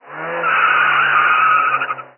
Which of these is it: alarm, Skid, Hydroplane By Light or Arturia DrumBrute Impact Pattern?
Skid